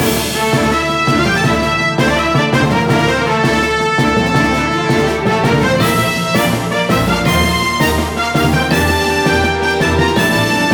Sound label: Fight loop